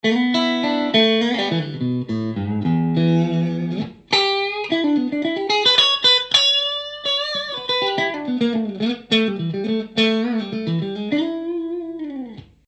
clean middle, Strat; schwarzbach; VP-Strat-66

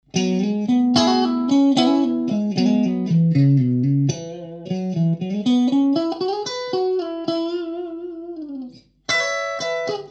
clean middle bridge, Strat; schwarzbach; VP-Strat-56

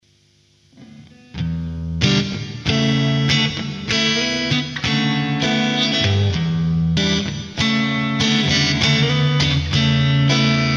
Neck Marsh Stalone, strat56

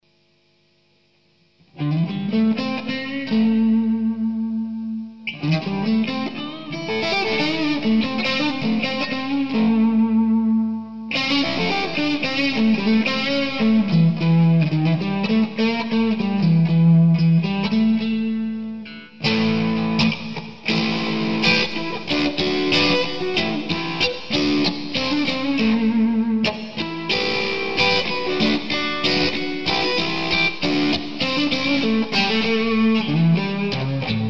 Neck Marsh Green OD

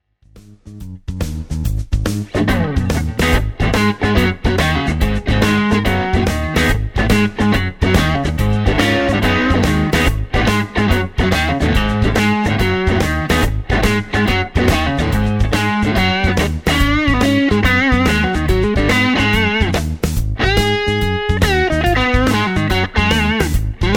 Crunch Neck, Strat; schwarzbach; VP-Strat-IrishTour

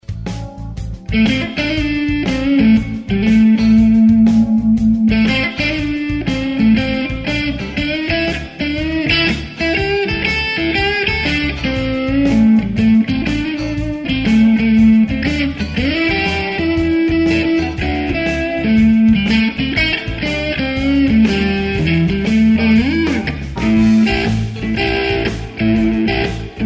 Marshall Blues Od; P90; Marshall OPD1

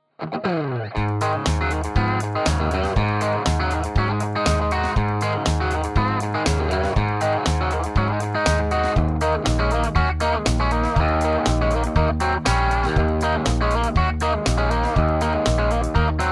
crunch bridge hot, Tele; schwarzbach; VP-Tele-57

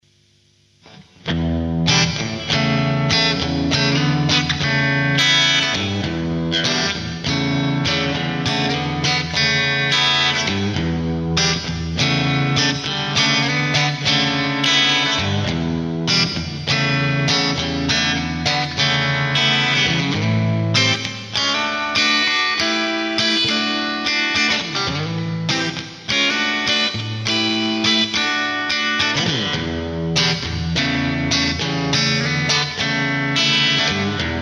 Bridge Marsh Stalone, strat56

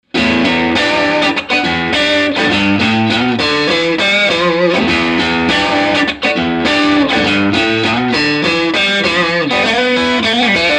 crunch middle, Strat; schwarzbach; VP-Strat-66

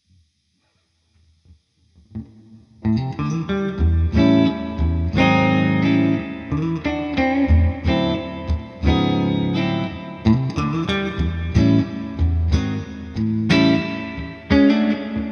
Mitte Fender Twin Clean, humbucker

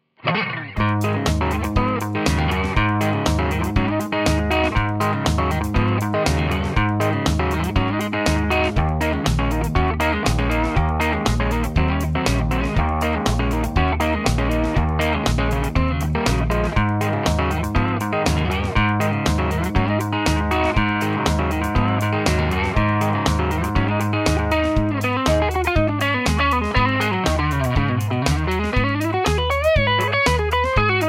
crunch neck, Tele; schwarzbach; Tele60s

